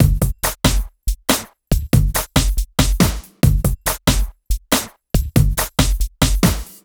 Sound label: SloMo Beats, Loops 140 BPM